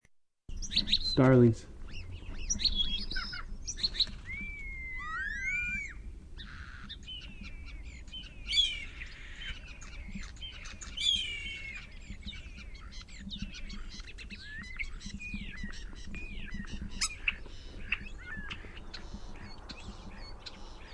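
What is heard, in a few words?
European starling warbled song whistled song, Sturnus vulgaris; Passeriformes Sturnidae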